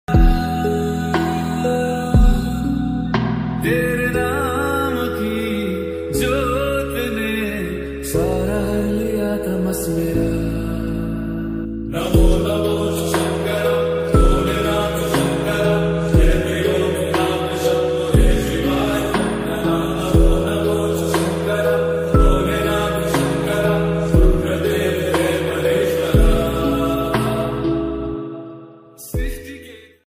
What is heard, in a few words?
mahadev ringtone bhakti ringtone hindi ringtone; shiv